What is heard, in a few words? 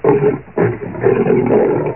Growl; Realmz 5.1.6